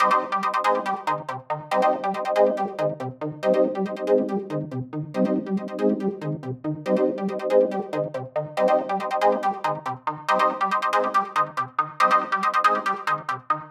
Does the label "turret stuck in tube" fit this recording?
No